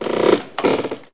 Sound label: wood floor squeak